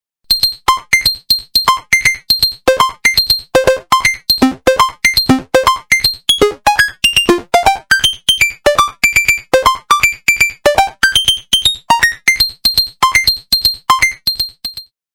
waldorf pulse

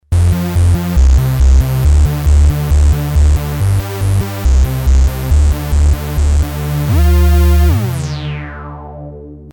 bassy, synths; PEAVEY PARADOX

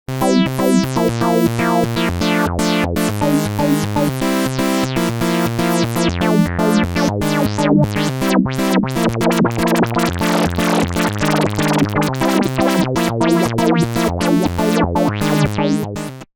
matrix1000

